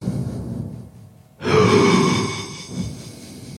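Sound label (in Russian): вздох монстра чудовище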